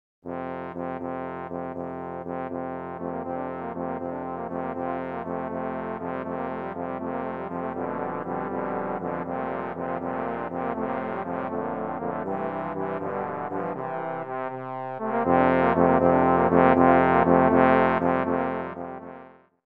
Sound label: Spanish Dance